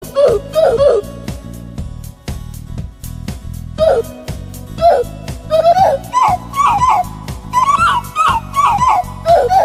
wii sports theme roblox death sound edition

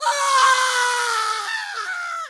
toy jumpscare sound; nights at zooplace 2

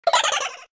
boo laughing; super mario galaxy

